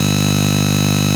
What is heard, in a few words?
saw stall; weapons; Sourceforts2.0